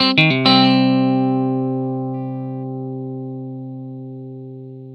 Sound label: blues guitar riff 120, Vintage Blues Guitar